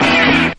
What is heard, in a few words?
bullet